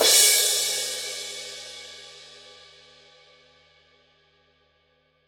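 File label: signature precision 18 thin crash edge, cymbales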